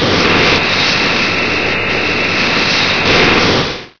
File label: tu retract, turret; source engine; hl1; video games